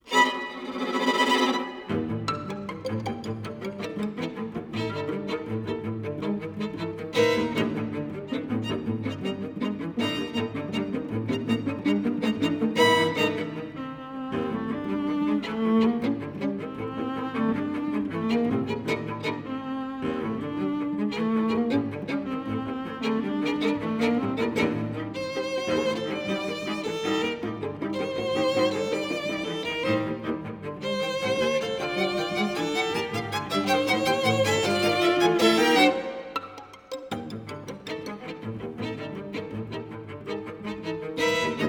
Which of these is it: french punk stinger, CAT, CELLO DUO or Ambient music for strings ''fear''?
Ambient music for strings ''fear''